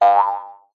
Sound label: cartoon bounce spring jaw harp